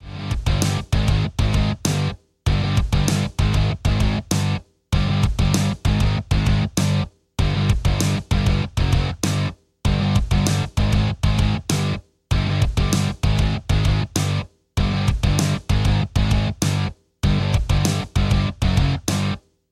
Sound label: hard rock ringtone